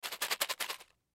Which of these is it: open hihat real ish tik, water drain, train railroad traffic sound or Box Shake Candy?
Box Shake Candy